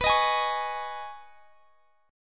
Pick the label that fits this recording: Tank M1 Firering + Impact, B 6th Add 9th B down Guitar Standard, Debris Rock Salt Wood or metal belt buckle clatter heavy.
B 6th Add 9th B down Guitar Standard